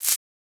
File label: Shaker